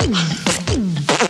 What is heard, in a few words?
barter, Beatbox; Drum loops